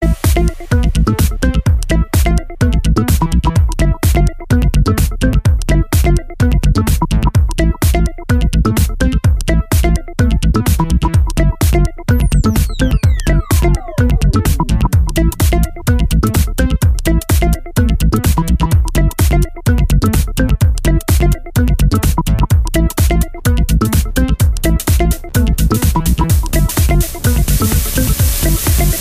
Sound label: house music